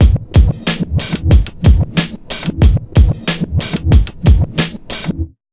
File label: 92 BEAT, Pure Electro, BEAT MIX